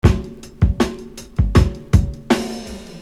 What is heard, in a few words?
Beat, Hip-Hop Street Beats